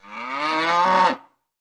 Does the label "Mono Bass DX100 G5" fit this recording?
No